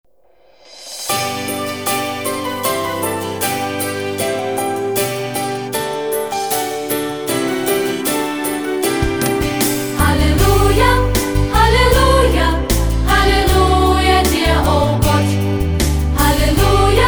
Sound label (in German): Halleluja Ruf, Chor; Tropinka Chor 2009